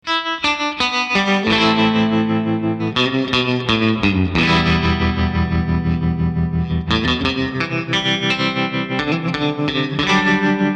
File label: TREMSTER